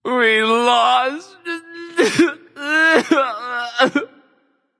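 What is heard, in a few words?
nonverbal boohoo; smite; voicepack; merlin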